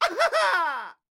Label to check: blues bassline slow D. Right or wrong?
Wrong